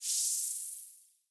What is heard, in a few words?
Sizzle Final Flourish